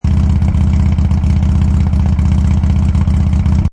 Motorcycle Harley Davidson Loop Rpm 2000 Exhaust Perspective; Motorcycle Sound Effects; Motorcycle, Motorbike